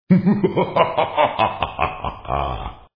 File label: final laugh